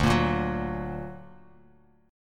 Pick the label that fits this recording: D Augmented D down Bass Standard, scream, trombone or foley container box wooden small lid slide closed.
D Augmented D down Bass Standard